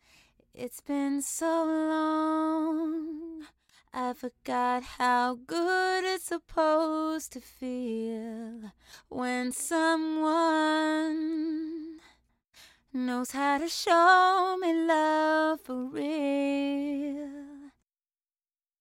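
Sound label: pop rnb 115 E major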